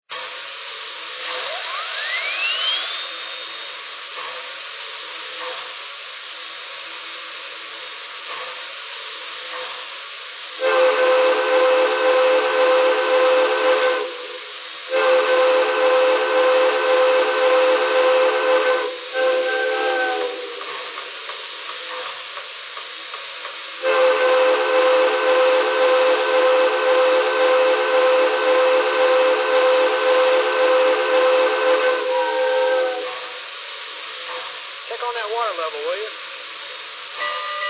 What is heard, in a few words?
hudson, trains; MLW